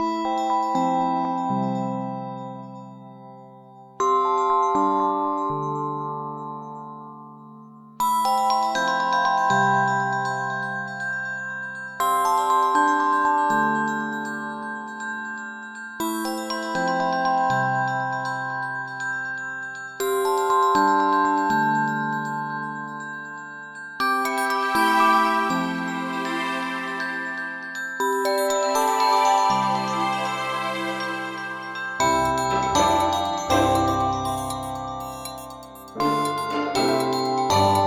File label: Morning Clock, alarms